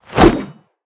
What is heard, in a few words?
alchemist attack